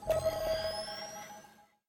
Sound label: Patrol Ping